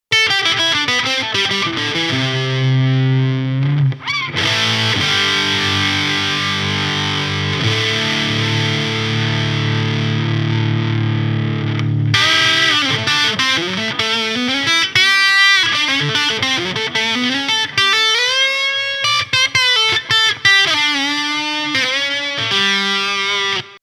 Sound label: kong tubefive head full gain